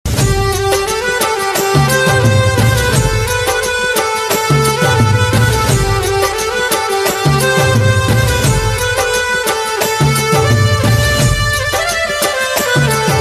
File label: Harmonium Instrumental Ringtone